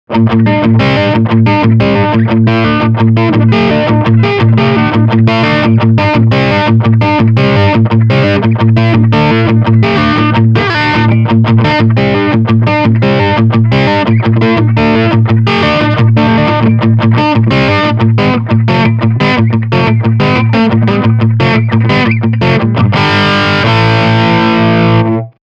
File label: Riff Crunch Channel